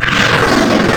Cobra, Wavetables; Cymatics; Serum; Animals for Serum - Gold Edition

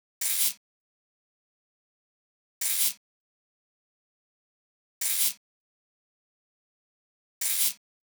Cabasa, Hip Hop Exotica, 100 Dmin; Apple Loops; Big Fish Audio

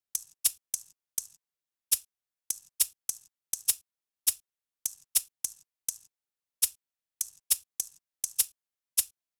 Shakers, Hip Hop Exotica, Drum Tracks, 102, Fmin; big fish audio; Apple Loops

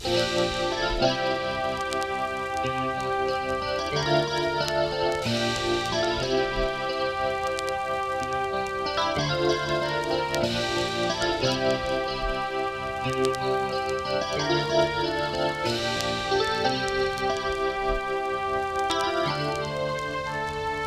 Full no vox, bpm92; StudioLinkedVST Vinyl Touch; Kontakt; hiphop; Progression 34

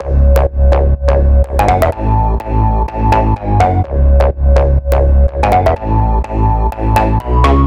Big EDM Bass Loop C#2; Future House; Future House Tools; Bass Loops

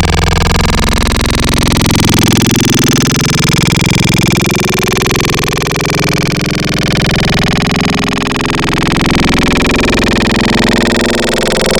Broken Glass, Growl Tables; Cymatics; Growl Tables Vol 2; Serum

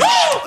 "Whoo", vocals; Future House; Big EDM; Future House Tools

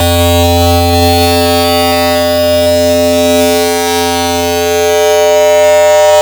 Magnet, Wavetables; Cymatics; Wavetables Collection Vol 3; Serum

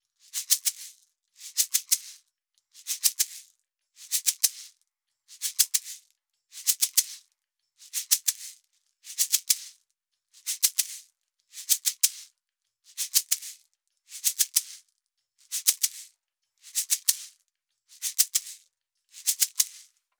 shaker 095; Hip Hop India Modern Percussion; 095bpm

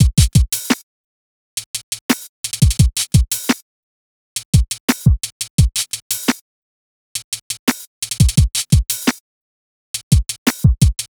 Drums, Hip Hop Exotica, 086 Emin; Apple Loops; Big Fish Audio

